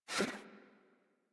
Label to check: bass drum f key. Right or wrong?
Wrong